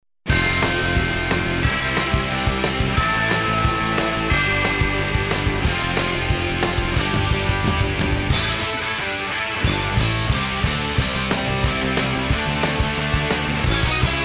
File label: Instrumental; Power Rangers Lost Galaxy